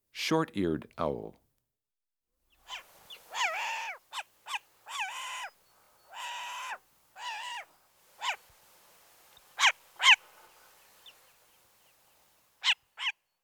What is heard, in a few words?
short eared owl; Peterson Field Guide to Bird Songs (Eastern/Central US)